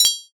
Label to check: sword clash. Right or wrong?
Right